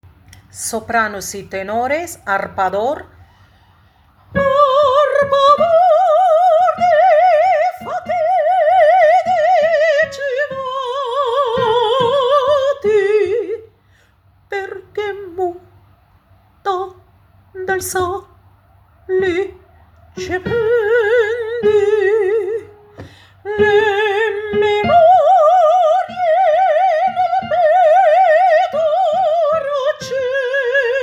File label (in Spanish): sopranos tenores; vapensiero